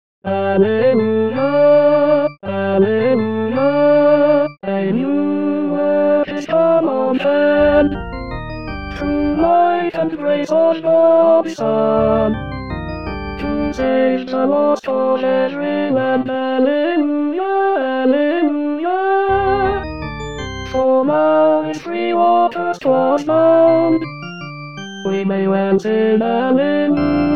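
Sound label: Alleluya, Tenor; Xmas 2019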